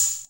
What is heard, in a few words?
Shaker; Equipped Music; Loungin House